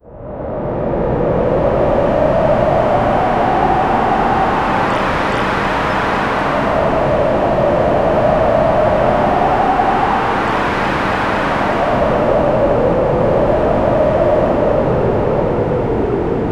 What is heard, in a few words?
atmospheric soundscape of, aerial